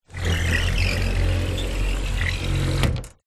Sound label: household window thin garage sliding glass squeaky